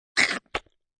Knfe Out Flsh, A knife pulled out of flesh; Stab And Slice Sound Effects; Combat

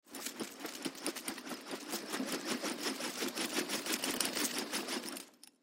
Ball and Chain Swing Fast Whoosh; Combat Swing; Warfare; Combat Swings; Blastwave

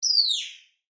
Animal, Bird, Chirp, Squeak; Animals; Bird Sound Effects

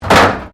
METAL BIN IMPACT CONCRETE; Materials

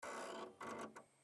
Dvd Player Turn On Mechanical Power Up; Technology; Blastwave

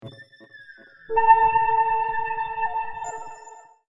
Ghost Of The Future, ghost sound effect; science fiction sound effect; Andrew Fleming; Twistedtracks

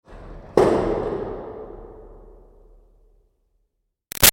Latch Metal Silo Boom Big Reverb; Latches (Sound Effects:Objects); Big Room Sound

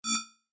Rollover, Short; Mouseovers (Sound Effects:Multimedia)

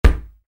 Soccer Ball: Kick Ball; Football; Sports; Stuart Duffield

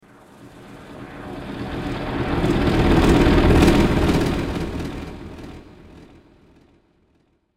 Giant Bug With Wings, monster sound effect; fantasy; Andrew Fleming

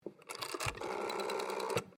TELEPHONE WOODEN AUTOMATIC DIALING WALLPHONE DIAL; Dial 7; Various old telephones; Electronics

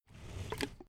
Animal Cow Beast Heavy Breath Mouth Noise Monster; Tom Hutchings

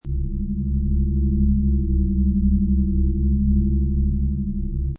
Space Scifi Sound Abandoned Spaceship; Space Scifi sound - Abandoned spaceship: Uneasy drone, core, ambient spacecraft or space station atmosph; Science Fiction Sound Effects; Lynne Music

